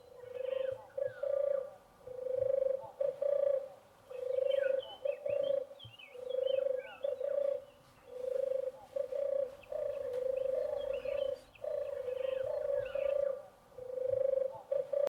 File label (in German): Stimme der Taube